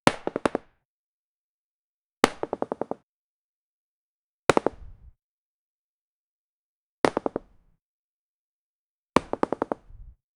the sound of arrows being fired rapidly from a bow